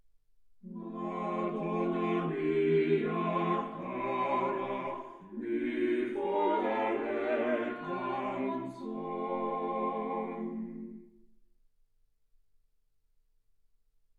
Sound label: quartet